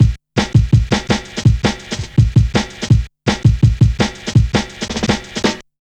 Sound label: Check A, Jungle, Drum Loops 165.5; Zero-G Jungle Warfare 1, 2 & 3